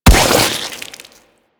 Chem Strike Nox Rifle Projectile Explode

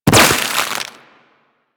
Proc Cold; Mods

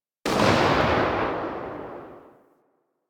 Briefcase Launcher Tail C, Weapons; Warframe; Corpus

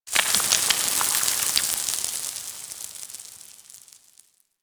Rock Crumble; Ambience; One Offs; Warframe; Quests; The New War; Drifter Camp

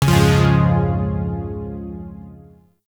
Shawzin Phrygian Chord B; Chords; Warframe; Zariman

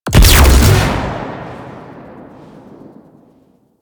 Sniper Fire, Arachnoid Wraith; Warframe; Corpus; Abilities

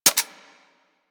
Braton Vandal Redux Fire Mech; Warframe; Weapons

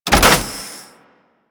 Key Remove; Ambience; Warframe; The War Within

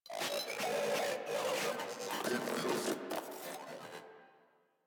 Moa Vocal Idle; Enemies; Warframe

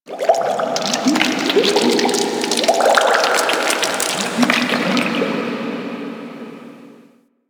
Ocean Cave Crack Small; Warframe; Grineer Ocean

